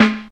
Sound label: clean acoustic snare sound a key, Royality free acoustic snare sound tuned to the A note